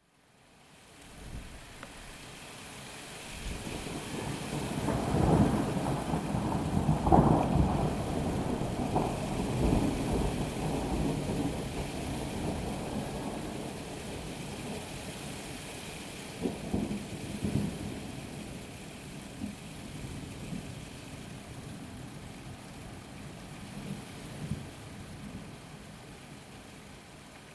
soundscape thunderstorm in January

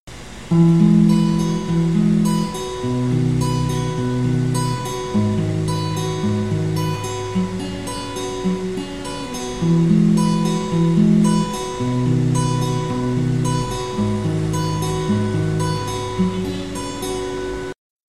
chords guitar

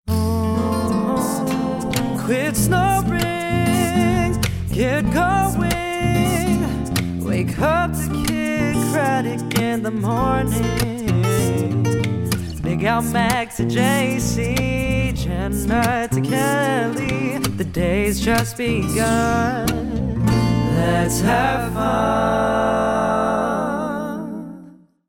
jingles

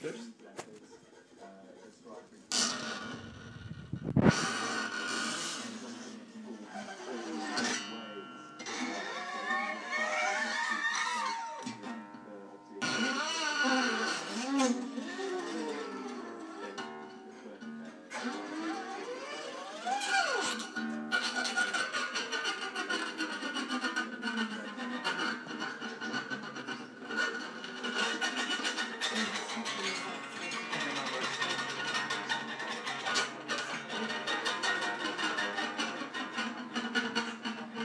scratchy tune

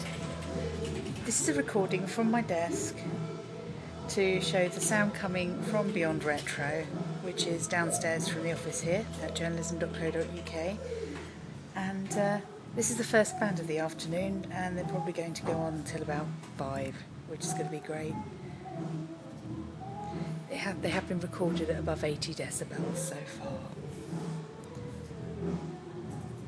loud band in the office

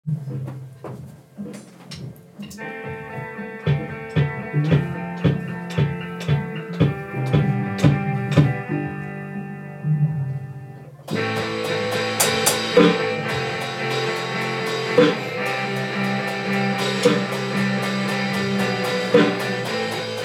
drum 4/4

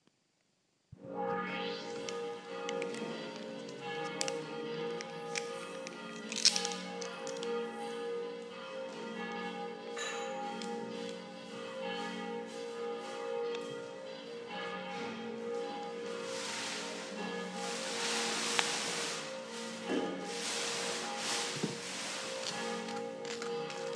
breakfast bells

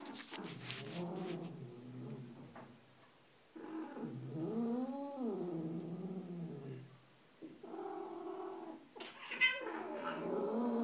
cat sounds katzengerausche

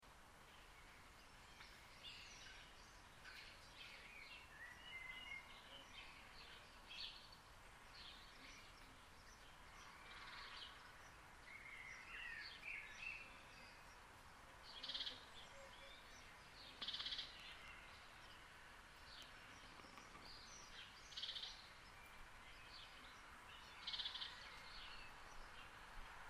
birds and thunder from bedroom window

